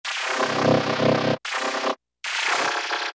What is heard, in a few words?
Sfx Airlock Door, Alesis Micron; Factory Programs